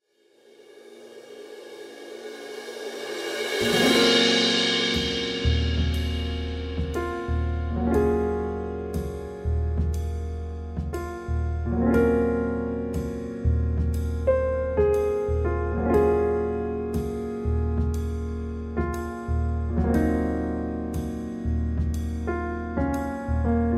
All Black Keys No Violins

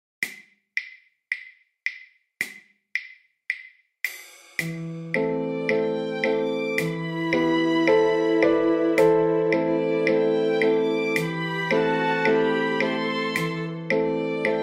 Counting in Fours Example With Beat

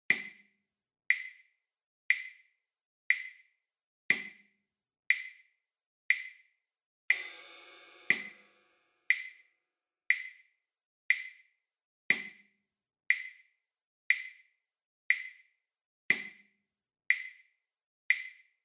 Three White Keys I Pattern Accompaniment

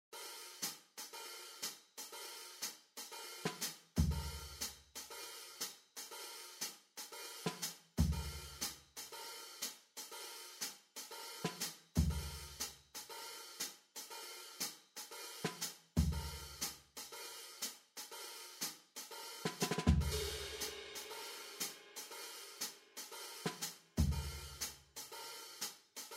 All Black Keys Pattern B Accompaniment

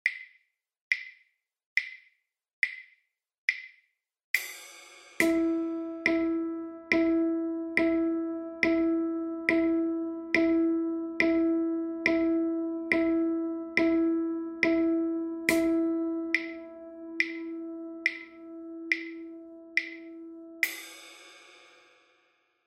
Keeping a Steady Beat Pattern A

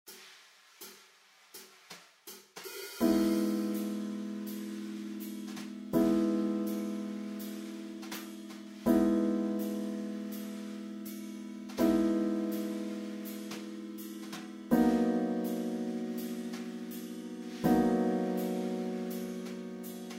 All Black Keys I Pattern C Accompaniment

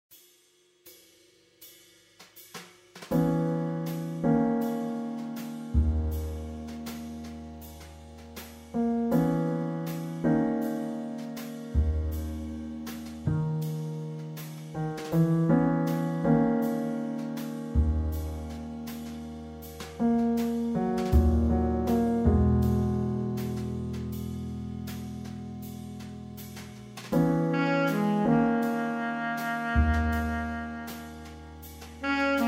Three Black Keys Lesson Music Accompaniment

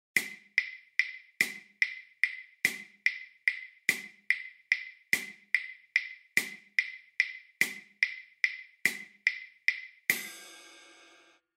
Counting in Threes Beat Example

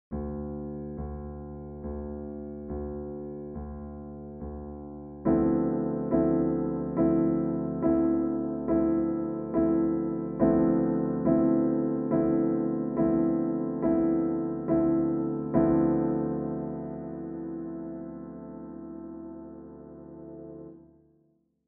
Keeping a Steady Beat Pattern B

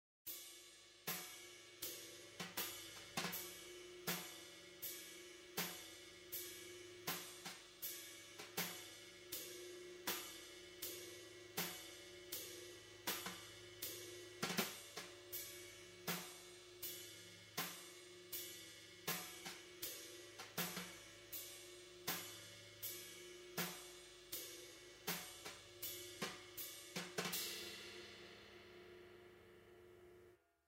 Three Black Keys Pattern B Accompaniment

